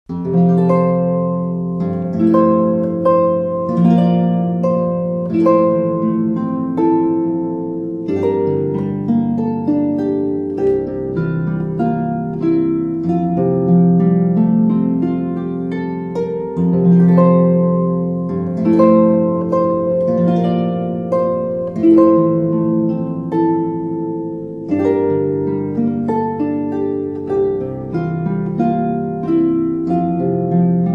lo how a rose eer blooming, christmas carols on the harp